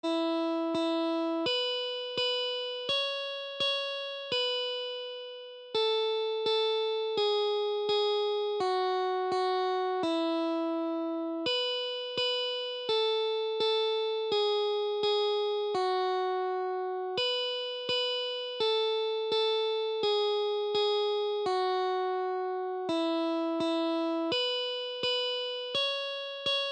kirakira sound

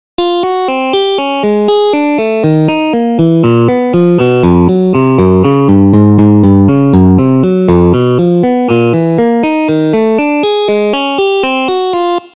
guitar sound

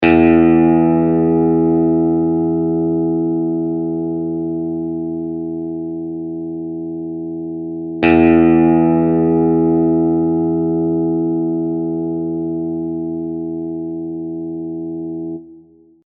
tune 6E, guitar

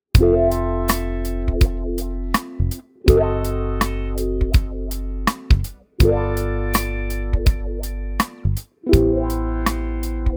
wah, guitar

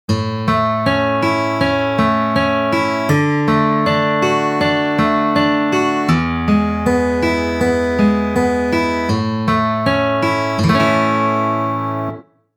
finger-picking, guitar

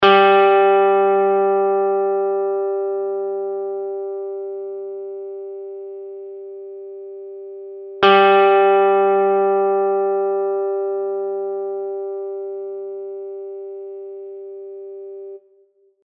tune 3G

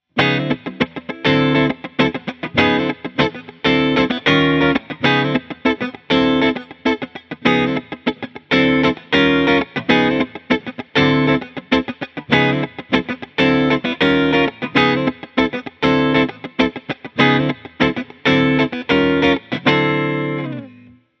pic thin, guitar

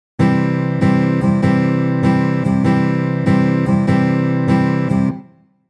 stroke, guitar

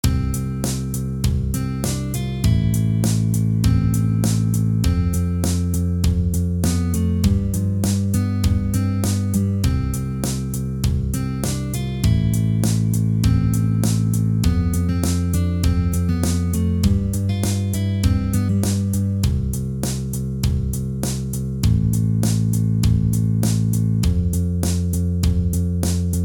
mixolydian, pocket guitar

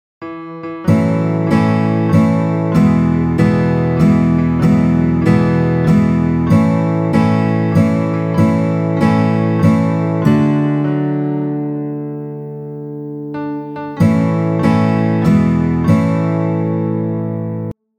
happy birthday, guitar

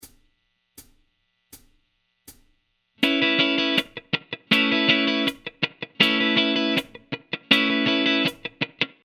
cuting c, guitar

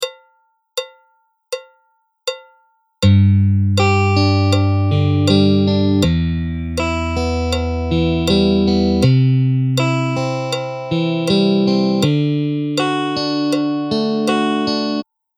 guitar arpegio phrase

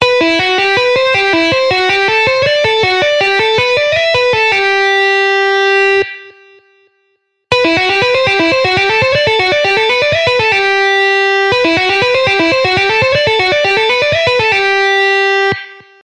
tapping sound, guitar

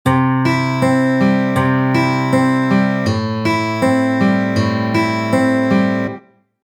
finger-picking, guitar

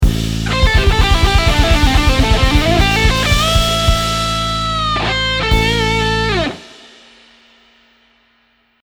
ending phrase, guitar

